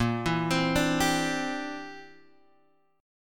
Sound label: C Major Add 9th, Bb, down, Guitar, Standard; arpeggiated